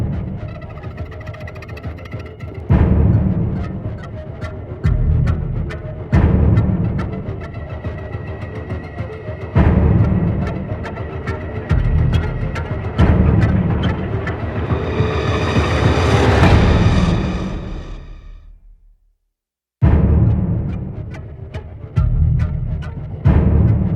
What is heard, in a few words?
End Music; Vitruvian Seq; Warframe; Cinematics; The Sacrifice; Sacrifice Quest